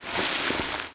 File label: bike weeds; Golden Crayon Sounds